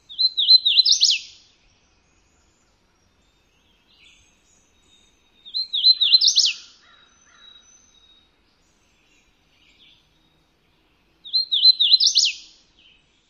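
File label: Hooded Warbler, songs number 3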